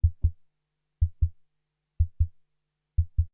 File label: Heart Beat; nordlead2